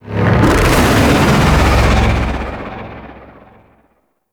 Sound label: Void Dragon Vocal Ability; Warframe; Duviri